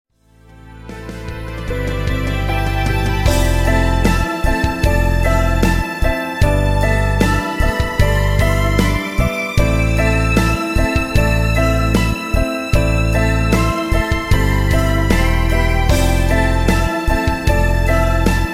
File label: pause loop; osu skins; leviathan